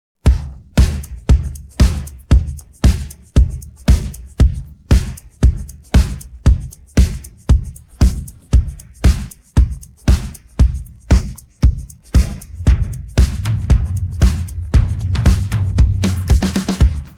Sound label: drums pattern